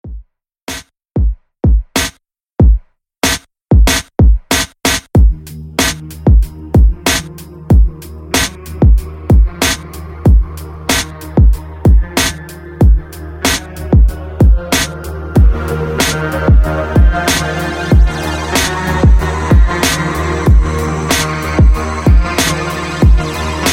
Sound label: Heavy Metal